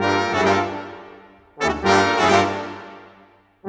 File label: Brass, Orchestral, Rock Pop; Sonic Foundry (Sony Creative Software); Orchestral Series VOL-4 - Rock and Pop